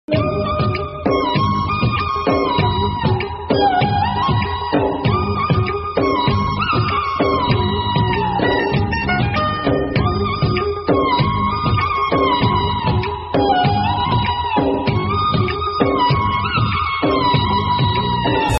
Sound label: violin ringtone; arya movie